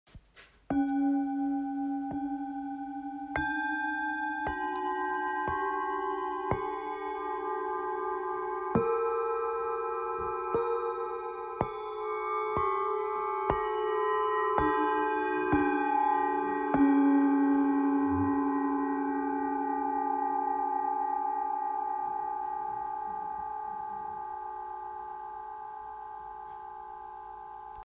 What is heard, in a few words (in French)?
Set 7 bols miroir